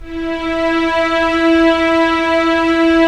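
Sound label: STR SC, string section; Roland - LA Composer vol. 1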